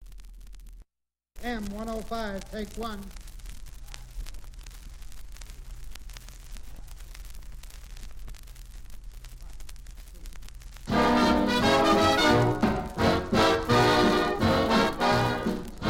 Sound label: Orch Instr